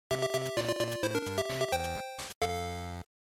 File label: General Clear, bgm